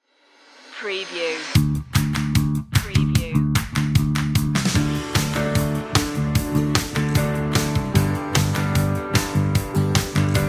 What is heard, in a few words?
bright future inspiring